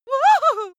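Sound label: female scared comic, screams; schreie; menschen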